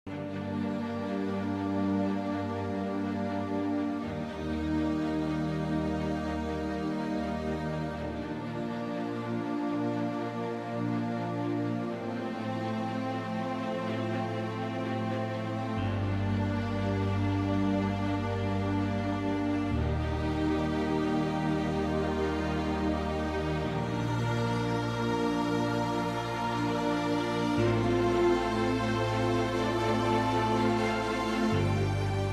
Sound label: Slow Victory; Strike Force Heroes